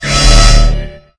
Pickups Powerups

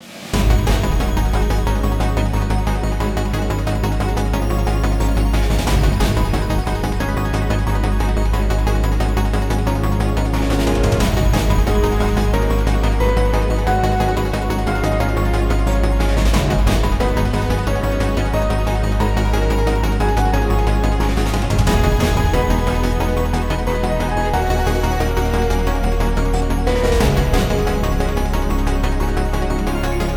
BGM Battle Guild Raid; aster tatariqus android ios mobile gamerip 2023

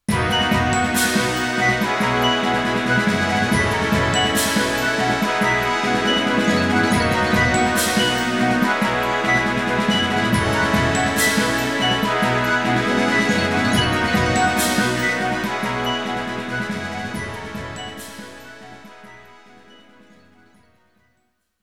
Victory Theme (Battle Victory BGM); genso suikoden original game soundtrack

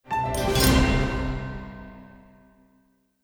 misc jingle

